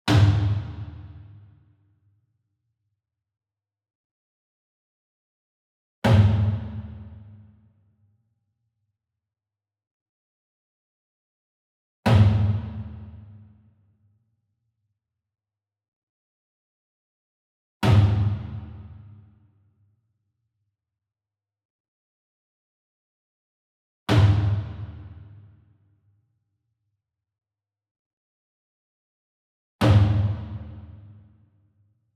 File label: SINGLE DRUM Kodo Hit Hard Beater Far; boom library; Cinematic Strikes Construction Kit